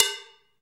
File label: PER COW, Cowbells